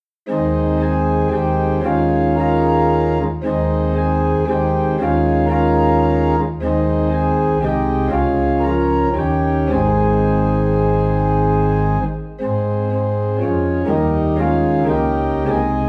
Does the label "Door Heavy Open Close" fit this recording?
No